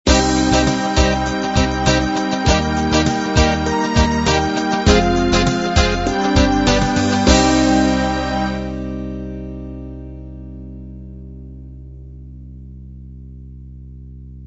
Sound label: pop ballad end